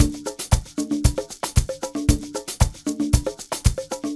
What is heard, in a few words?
Ensemble, Latin Percussion